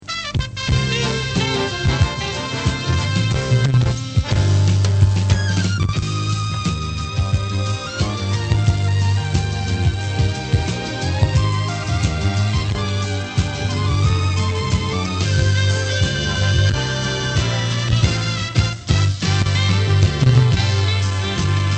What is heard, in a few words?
instrumental, jingles; radio glen memories